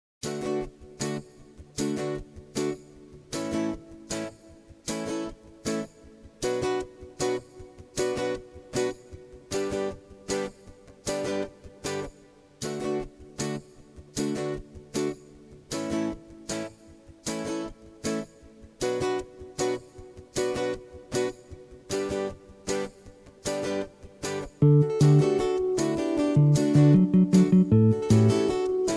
Reggae vibes

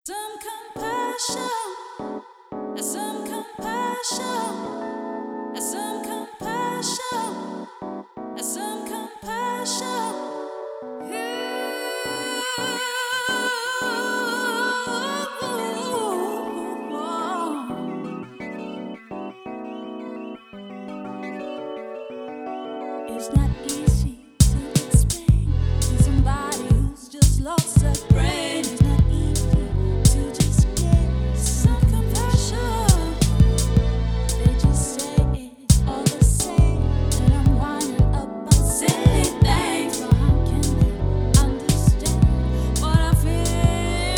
vocall chill, 432 hz

